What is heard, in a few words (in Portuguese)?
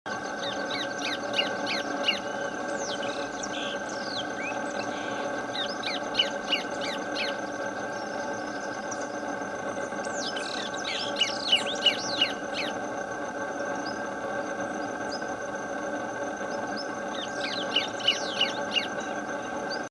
Canto do joao botina do brejo